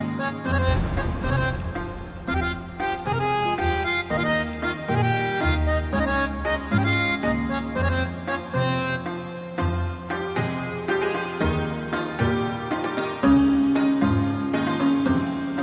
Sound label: pakistani drama music; mere humnasheen